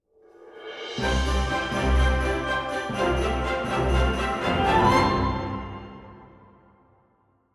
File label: Transition